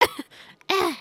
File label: trip, emotes